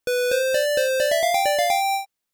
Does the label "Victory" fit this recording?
Yes